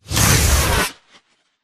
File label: drone teleport in; coop; Titanfall 2